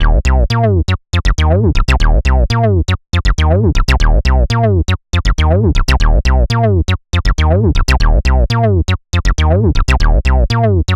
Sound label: Acid Blues